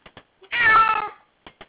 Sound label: cat meow